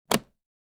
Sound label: briefcase lock